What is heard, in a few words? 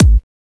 kick